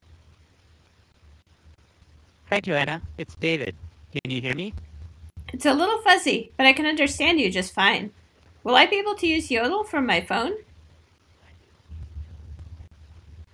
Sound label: yodel voice sample